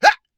Hah Sharp

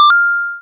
Coin

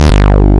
Negative buzz

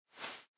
Sand Step

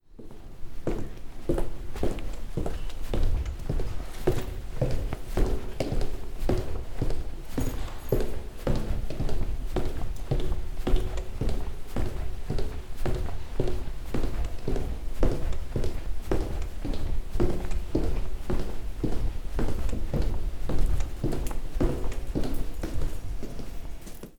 Steps In A Long Corridor

